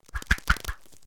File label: plant grab